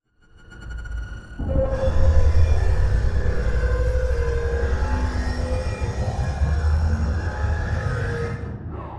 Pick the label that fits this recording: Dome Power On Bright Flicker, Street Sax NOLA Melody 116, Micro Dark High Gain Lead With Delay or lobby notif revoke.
Dome Power On Bright Flicker